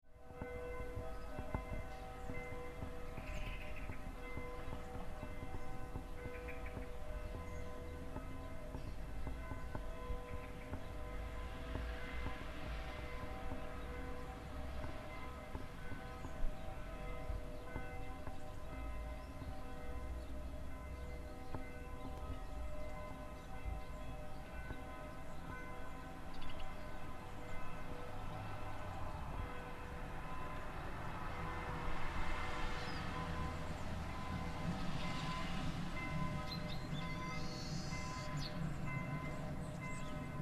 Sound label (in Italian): Suoni Campana Mezzogiorno; brione rovereto